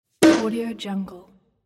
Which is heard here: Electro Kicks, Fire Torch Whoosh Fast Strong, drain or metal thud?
metal thud